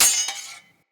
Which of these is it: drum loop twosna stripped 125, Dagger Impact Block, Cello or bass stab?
Dagger Impact Block